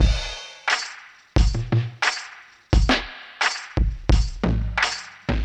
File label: Drum loops, Boombap